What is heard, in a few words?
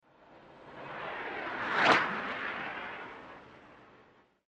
Fast Blow Torch By